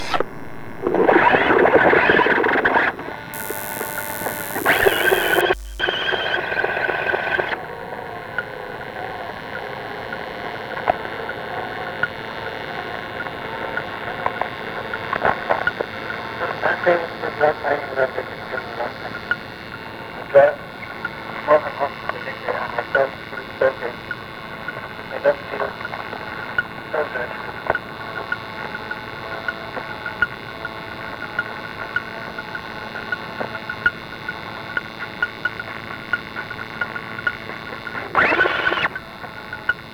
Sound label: ambulance